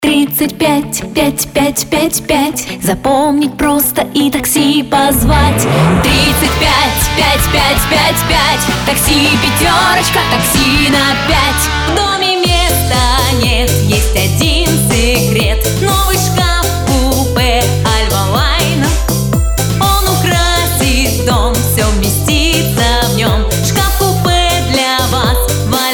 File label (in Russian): vokal